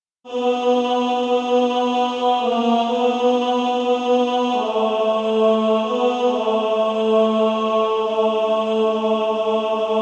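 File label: Female Choir, alto 1; SSAA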